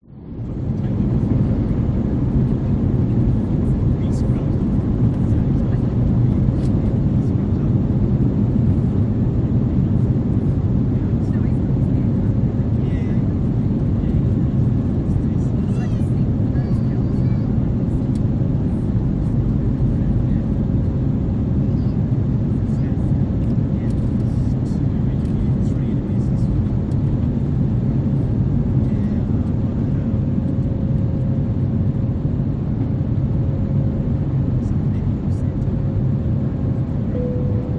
airplane airbus a320 during takeoff engines ease power mid way few voice; vehicles; voices